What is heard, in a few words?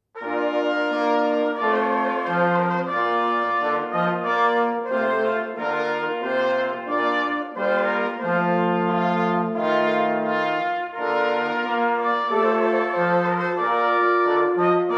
Trumpet Voluntary; Clarke